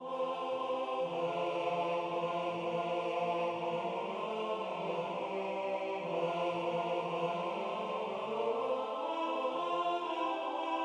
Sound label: Haute Contre; Revecy venir du printans Rechant